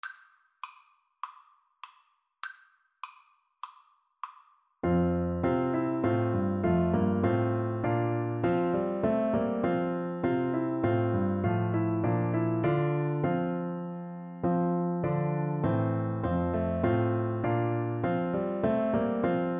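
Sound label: viola karaoke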